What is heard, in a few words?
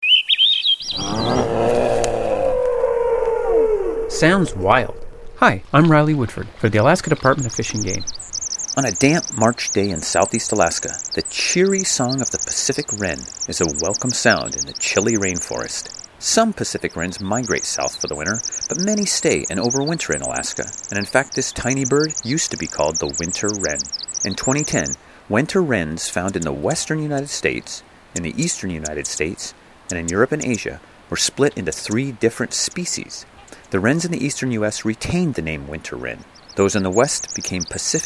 pacific wren